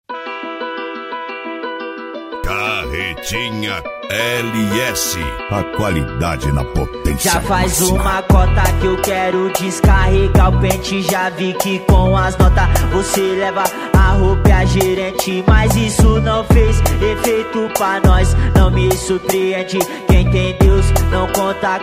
medley funk; carretinha ls porteirinha

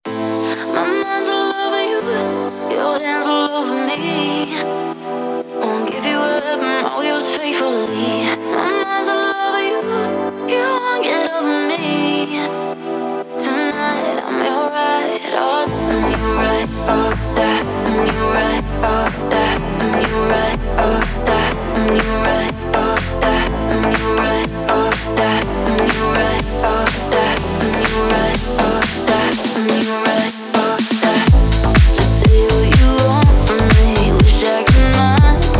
house

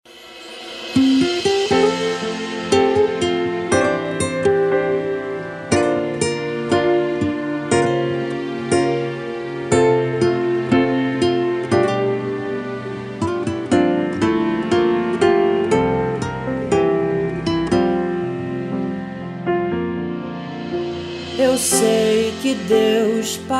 remix gospel

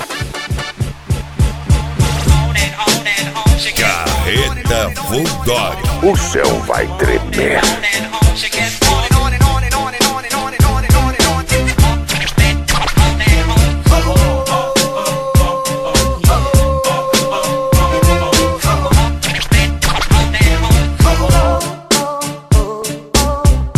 flashback

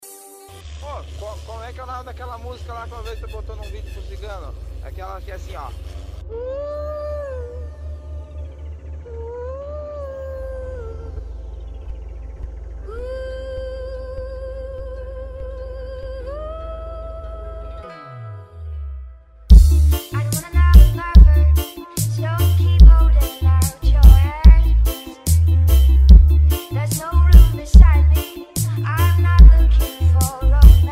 sound car reggaeton; cd volks sound car

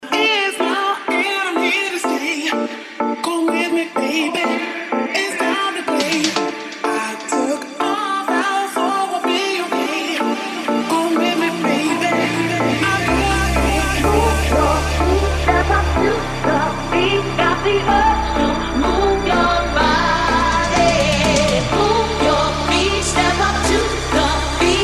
deep house

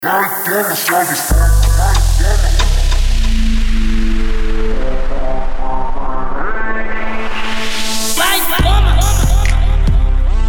trap funk

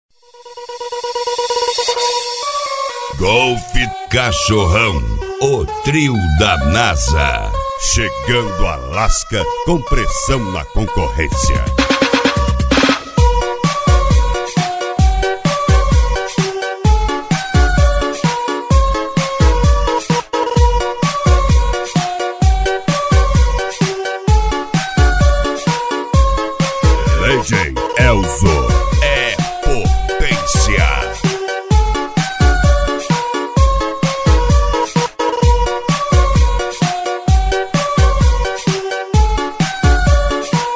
instrumental; praia do coco; cd golf cachorrao o trio da nasa

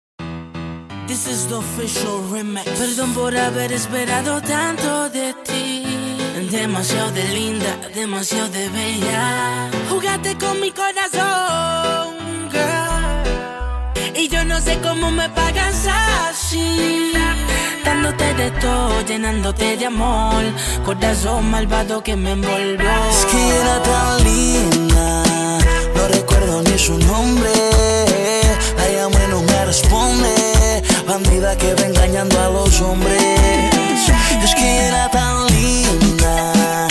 reggaeton mix; reggaeton mix evolution

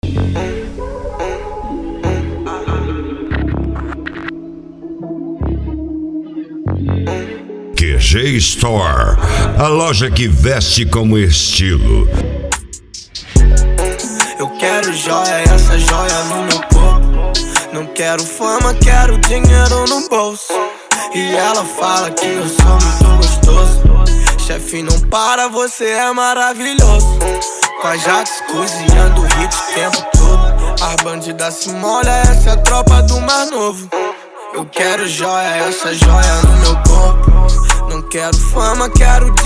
trap

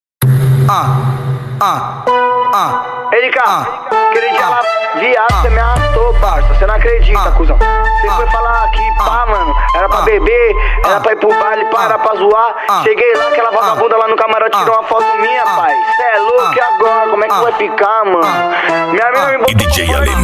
mega funk; trincando os graves

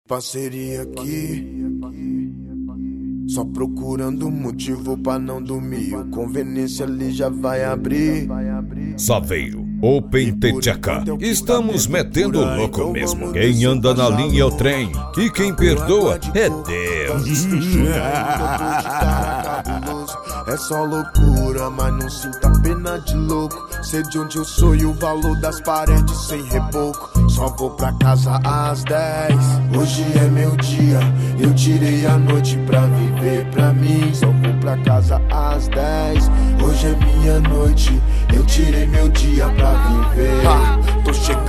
bass; saveiro open the theca

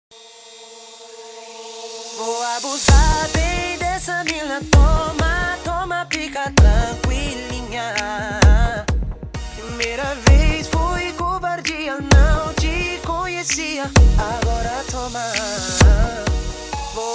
funk in beat

